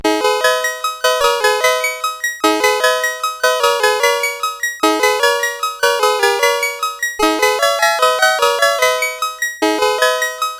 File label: Soundwave Tubular Bells